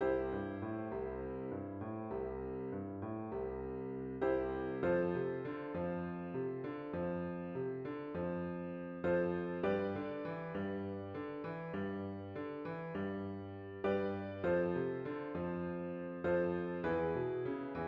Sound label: piano